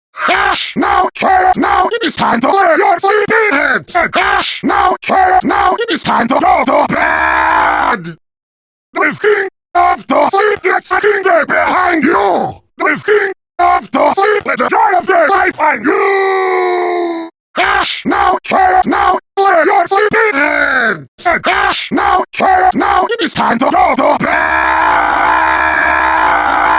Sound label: Lullaby Fortress (Acapella)